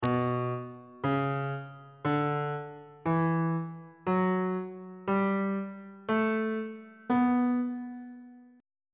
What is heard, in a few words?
c flat minor scale note positions on bass clef